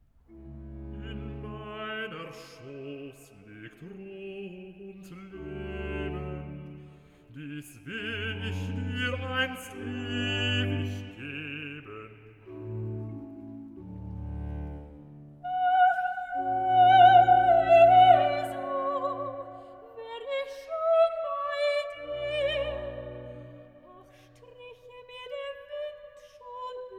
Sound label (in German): recitative